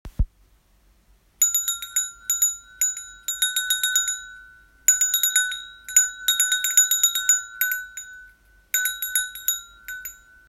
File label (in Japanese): kumasuzu sound